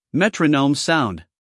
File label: metronome sound